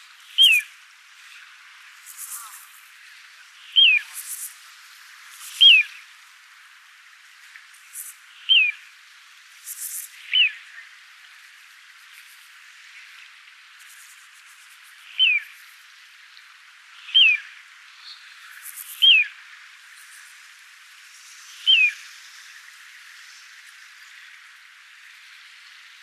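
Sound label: little ringed plover voice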